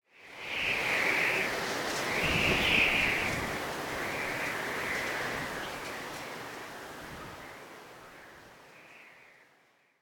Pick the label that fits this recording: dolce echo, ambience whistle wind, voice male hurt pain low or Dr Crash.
ambience whistle wind